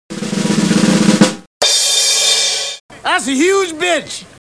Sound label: drumroll